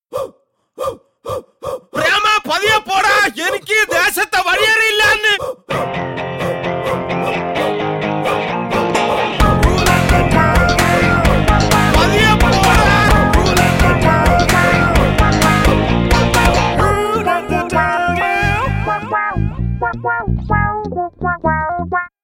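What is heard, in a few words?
Background Score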